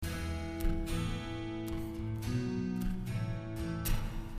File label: Plucking E,A,B,A 57